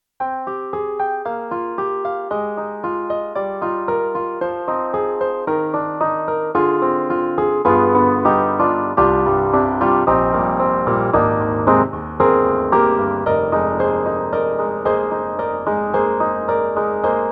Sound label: YAMAHA MU1000EX OldestAP, ピアノ